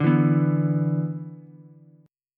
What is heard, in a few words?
B-Minor Double Flat 5th, Ukulele; Bmbb5 strummed; JGuitar